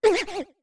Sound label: Gasp; Deku Link's gasps after a big jump